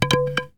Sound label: Baseball Bat Fall